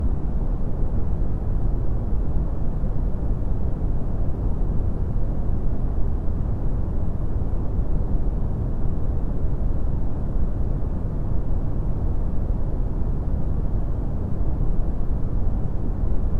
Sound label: space sound